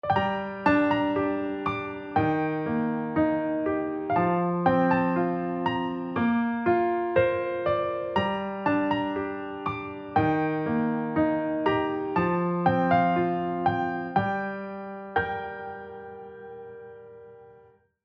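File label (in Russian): грустный и успокаивающий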